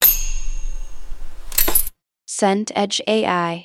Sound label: elevator ding